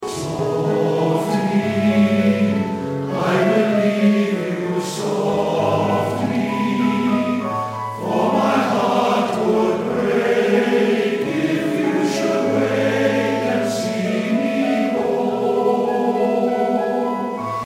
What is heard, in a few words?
Soft